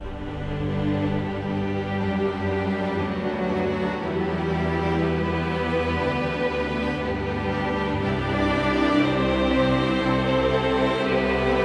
Glory Ambient

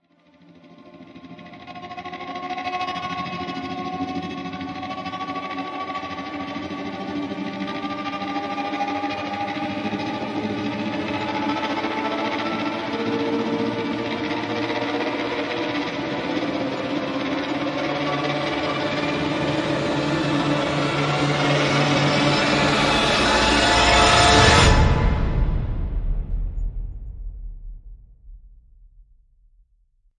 Tension Rise; Gothic Storm Music

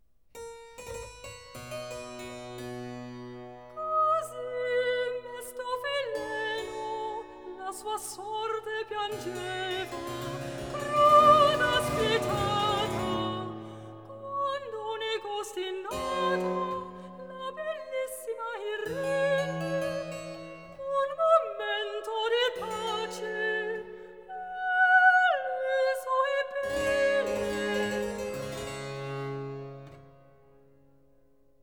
Recitativo, Cantata for Soprano solo; Antonio Lucio Vivaldi; Geme londo che parte; RV 666

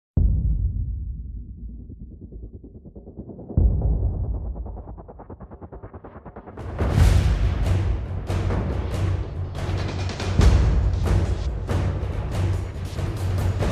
Annihilated (Perc Only), Epic Builds And Action; Brand X Music

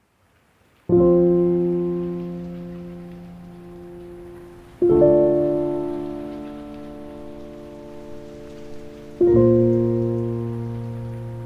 Piano & Rain

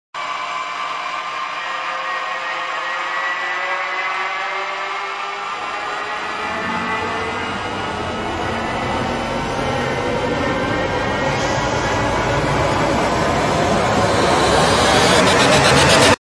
Rise Only; Ninja Tracks

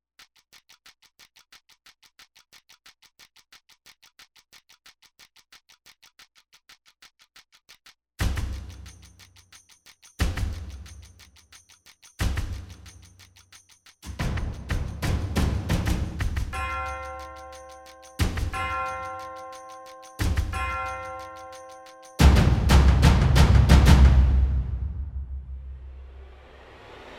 Perc Only; Brand X Music

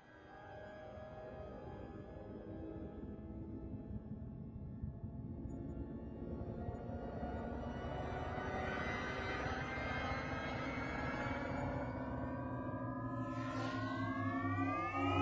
Risers Hyperbolic; Gothic Storm Music; Advanced Sound Design

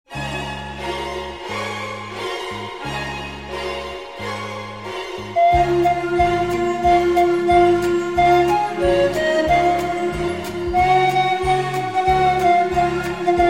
Jingle Bells, Pan-Flute; Pan-Flute For Christmas

